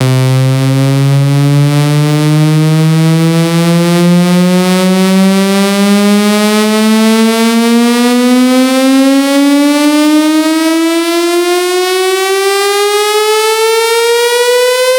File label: 128BPM Rise Up 8bars; Shockwave - Fx Elements vol 1